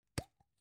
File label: object box gum plastic open soft; smartsoundfx